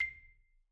Marimba hit Outrigger C6 loud; Idiophones; Struck Idiophones

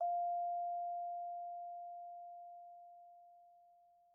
Vibes soft F4, Vibraphone, Soft Mallets; Struck Idiophones

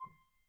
Grand Piano, Kawai, rel C5; Chordophones; Releases

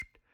Hohner Special20 F Hand Vib rel C6, Harmonica; Aerophones; Releases; Free Aerophones

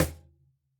Cajon hit f

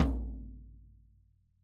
Rope Snare low ns, Snare Drum, Rope Tension; Struck Membranophones

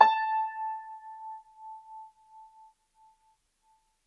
Grand Piano, Kawai, Sustains, A4; Chordophones; Legacy

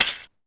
Tamborine, Sequential Circuits Drumtraks; drum machines

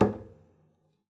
pizz noise mute d, double bass; dsmolken; noises

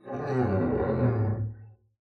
arco noise moan, double bass; dsmolken; noises

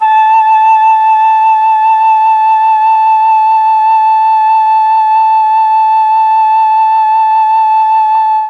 A3 mellotron flute

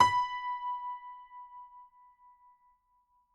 GPiano sus B4, Grand Piano, Kawai; Chordophones; Sustains

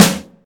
drum snare hard

